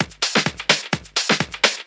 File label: Latin Loop 128 BPM